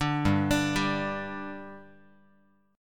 G 5th G, Guitar; G5 arpeggiated

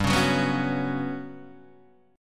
G Major 7th Flat 5th, Guitar Standard; GM7b5 strummed; 3,4,4,4,2,2

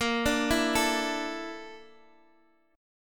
A sharp Major 7th Flat 5th, down, Guitar, Open D

